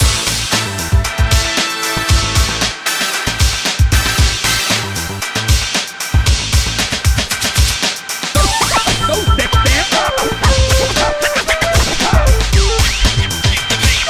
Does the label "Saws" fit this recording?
No